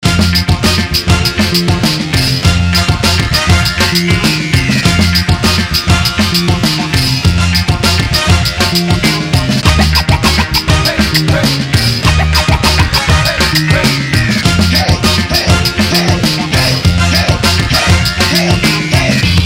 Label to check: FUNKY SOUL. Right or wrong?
Right